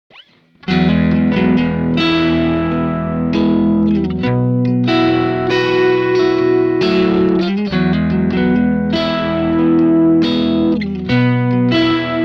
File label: tech21 liverpool strat